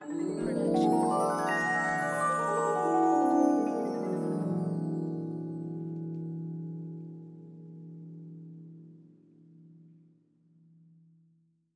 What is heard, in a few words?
fantasy gliss